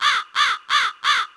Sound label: crow half; zingaro song generator